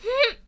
hrmm; peach; char-select-extra-chars; sm64coopdx

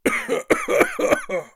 coughing; wario; sm64coopdx; sfx custom wario peach

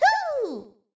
"hoo"; toadette; sm64coopdx; char-select-extra-chars

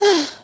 snoring; Toad; sm64coopdx

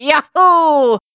yahoo; Luigi; sm64coopdx

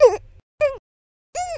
drowning; yoshi; sm64coopdx; char-select-extra-chars

